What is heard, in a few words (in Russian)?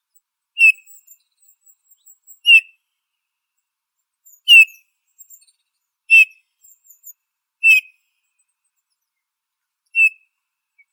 zvuki prirodi snegir obiknovennii pyrrhula pyrrhula